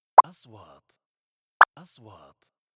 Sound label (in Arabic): نغمة نقره هوائية; transitions